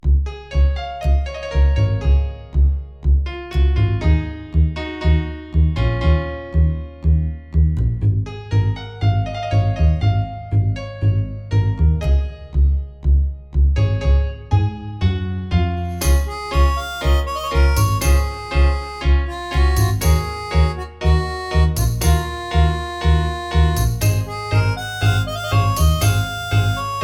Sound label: ballroom dance free bgm fashionable music that feels paris